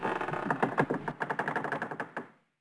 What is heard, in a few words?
boat creak